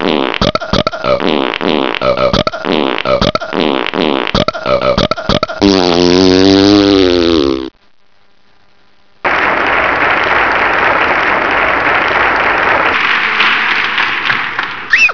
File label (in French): superdégueu